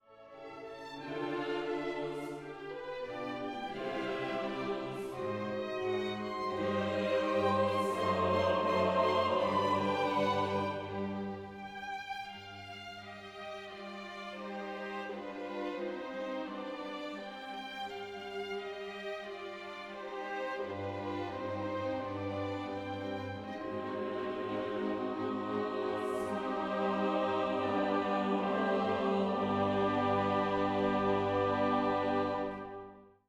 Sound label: Sanctus Second soft